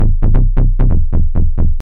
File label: 133 bass mid techno, f; loops